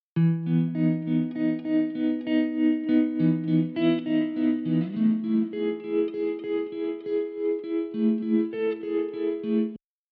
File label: guitar meta filter on